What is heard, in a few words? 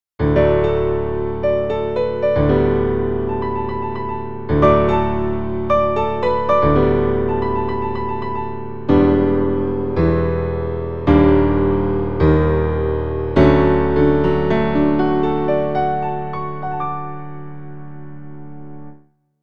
Prelude of Light Piano; The Legend of Zelda Ocarina of Time